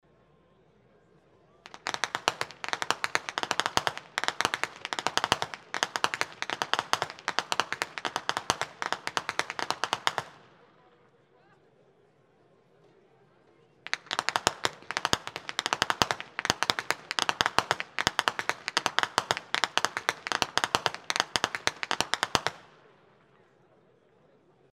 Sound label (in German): schnalzen; ANGER III; rupertigau